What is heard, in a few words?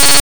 shoot, attack sound; カタナ１